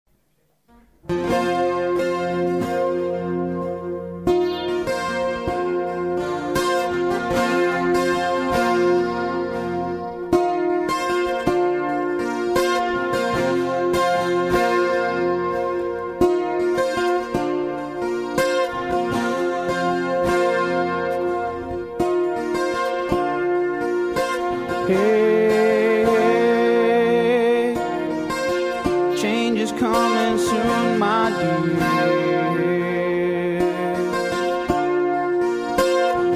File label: Acoustic